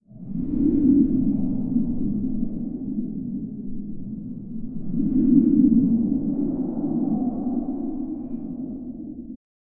underwater ambience with, whale calls

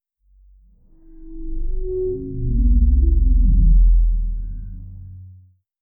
a organic technological a

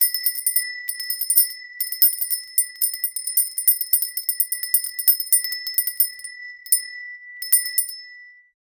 small hand bell tinkling in a shop

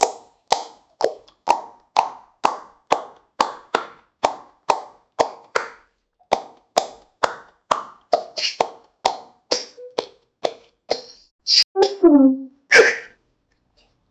make the butt clapping in

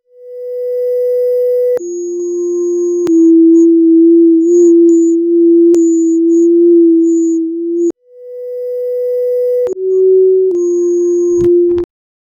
sound of death, pixel art

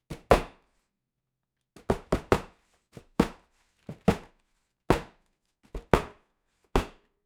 man boxing punching bag

